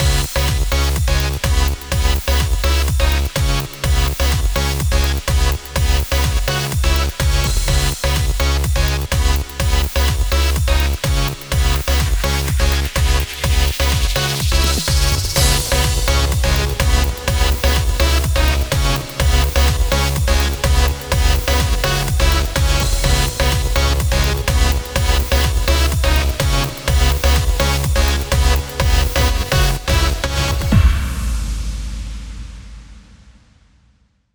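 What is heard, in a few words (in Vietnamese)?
Nhạc nền mở đầu video sôi động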